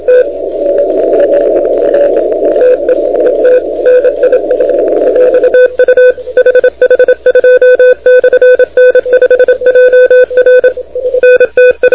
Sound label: CW 28MHz; ARRL10M